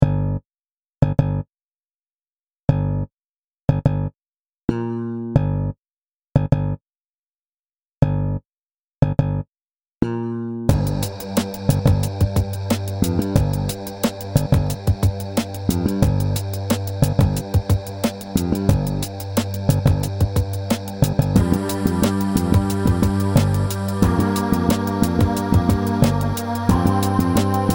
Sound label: nu Groove